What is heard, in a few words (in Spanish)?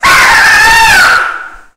grito rica